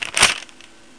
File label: Rip